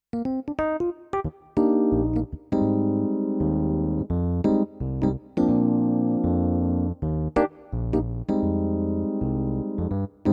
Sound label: CVP609 EPiano